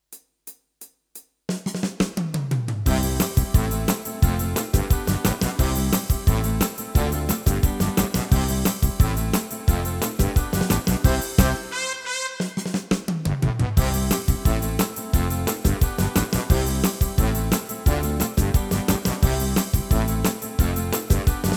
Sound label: happy music